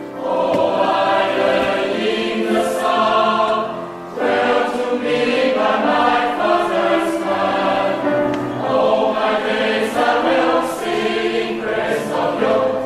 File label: Choir; Tianshan Lark Choir